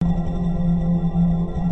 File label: reels spin